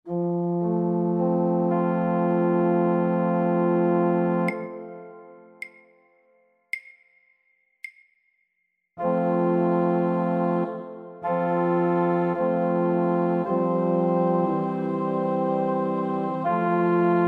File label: Accomp; Full Choir